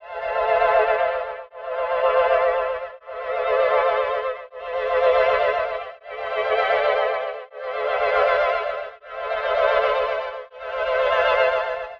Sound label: 80 Dm Ambience, Ambient Synth Loops; Aerosol